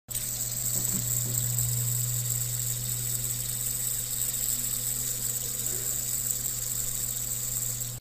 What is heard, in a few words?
fidget spinner